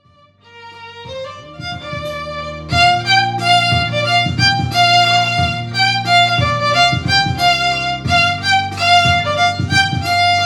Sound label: Solo Examples, electric violinist